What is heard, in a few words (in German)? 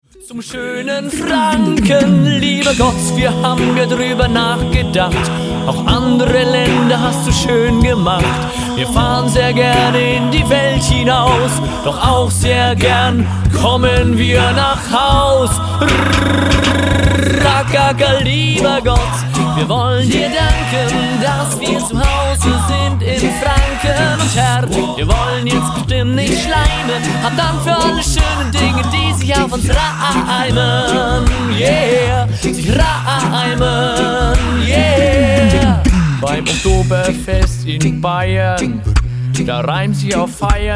der frnkische reggae